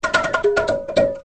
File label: conga